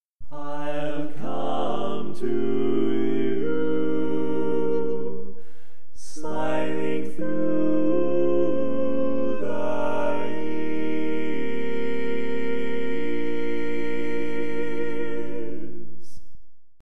Bass, barbershop

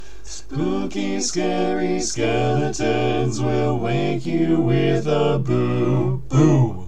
All Parts, barbershop

